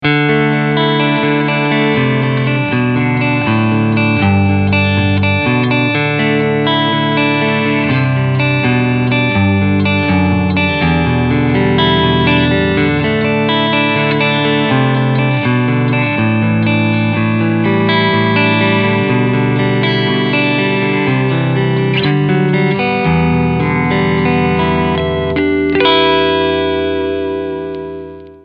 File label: Ch1 Clean